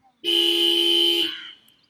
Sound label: Big Blast